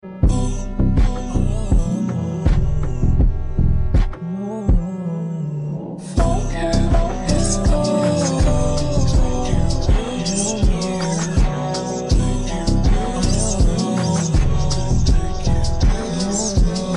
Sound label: remix